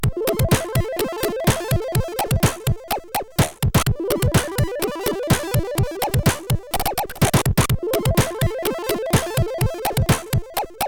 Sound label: Speed Tune, sid CSG8580R5